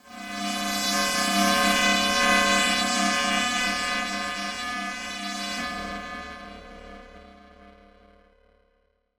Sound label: Transition Feedback Sweep; Adobe; Production Element